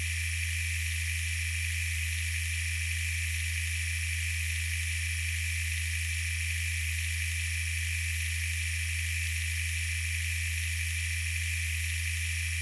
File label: machine; Monster girl quest